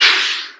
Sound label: hovercraft jump